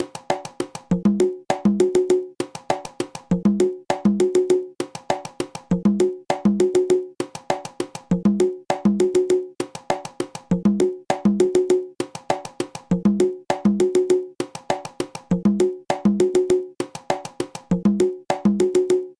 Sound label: pilon conga